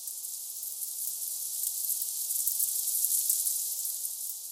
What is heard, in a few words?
ambient